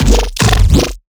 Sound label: Riddim Bass Hit F# Move It, One Shots; Ghosthack; Riddim Essentials 2020